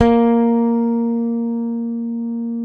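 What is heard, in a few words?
Sadowsky 5 bass, A#3; Sampleheads; Will Lee Bass Library; SADWSKY5 SUS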